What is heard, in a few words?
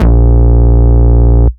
Root A#, Ultimate Bass; Vengeance Samples